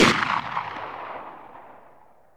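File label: rifle distant shot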